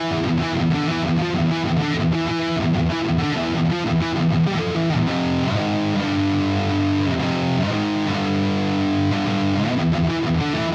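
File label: Rhythm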